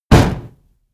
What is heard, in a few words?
fire hit; whooshes rises; hits impacts